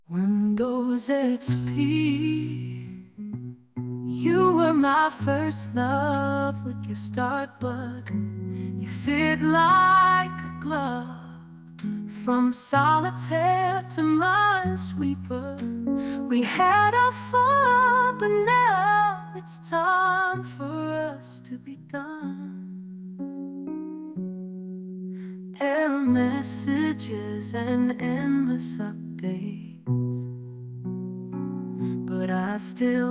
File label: Windows XP Blues